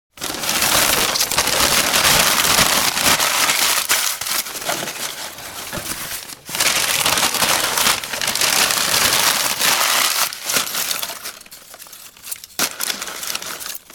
Preparing a fire in household fireplace with newspaper and sticks.; Fireplaces; Laying A Fire